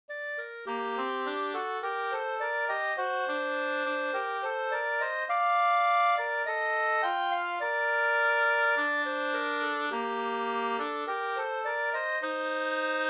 vismelodi; Allt under himmelens faste

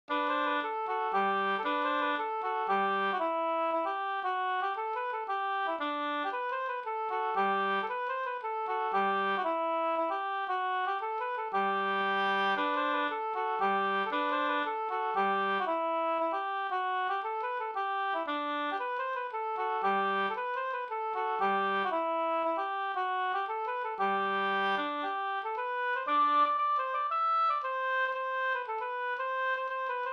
hamburska; Janta och jag e Edvard Pettersson

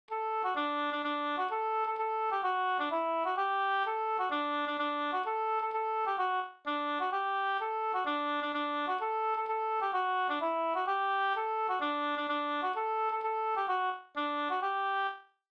folkdans; Skinkompass e Ernst Abrahamsson Bh

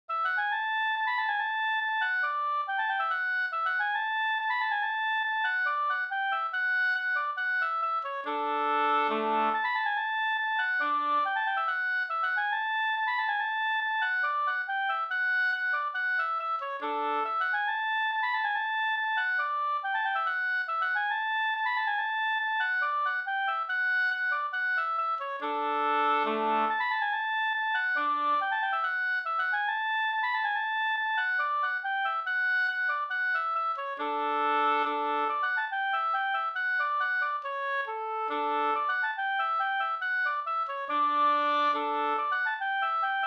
halling; e Omas Per Transtrand

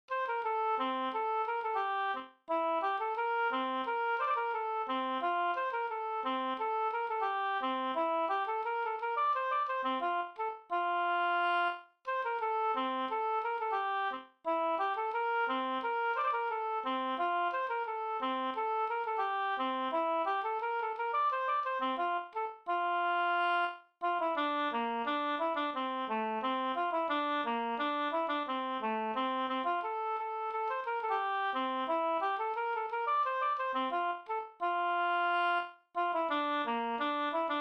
polka polkett; fr Lovsta bruk Nr2 e CoH Wallin

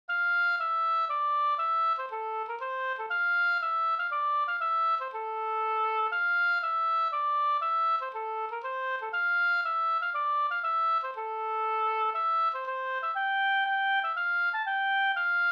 polska Bodapolska

